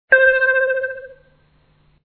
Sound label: correct sound